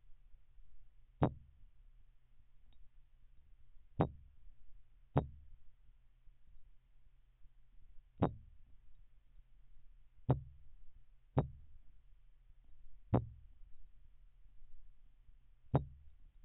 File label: Rhinecanthus aculeatus Drumroll